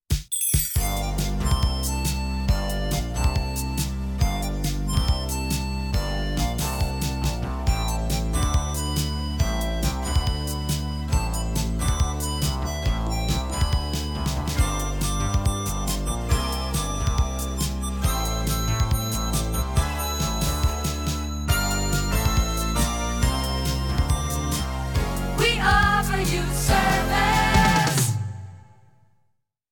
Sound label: 30 Sec. Music Bed With Tag Sing